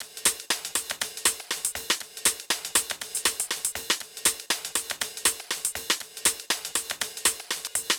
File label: Hh Rm Loop 120; Equipped Music; Loungin House